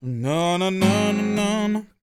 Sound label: MOANIN, Vocal Planet Blues & Soul